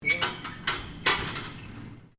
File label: Gate Supermarket Aisle Gate Close; Doors